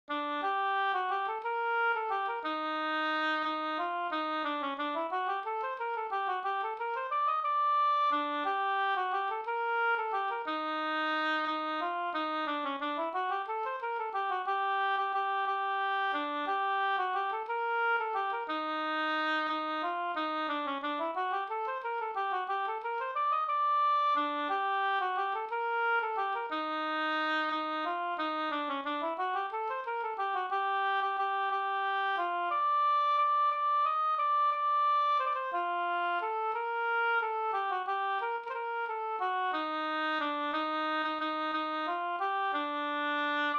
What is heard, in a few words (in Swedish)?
vals